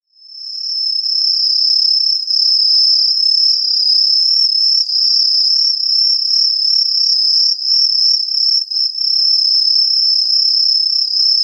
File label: aomatsumushi